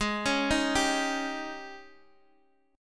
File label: Ab Major 7th Suspended 4th, Guitar, Open G; x,x,6,6,4,5; down